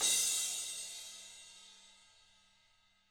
ZILDP CRASH; ILIO; Double Platinum Drums 1

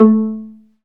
STR VLA PI, Chamber Pizz; Roland L-CD702

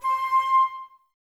flute, Wood Winds, Flutes

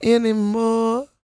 croon, Vocal Planet Blues Soul; Spectrasonics

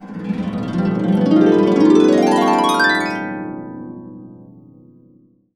B CASCADE UP, Harps; Complete Harps; USB Soundscan vol.62; AKAI

